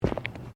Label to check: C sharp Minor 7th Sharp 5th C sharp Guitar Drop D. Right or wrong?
Wrong